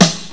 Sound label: snare